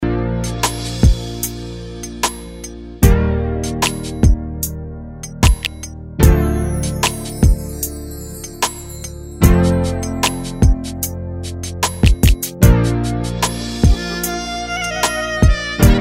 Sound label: sax machine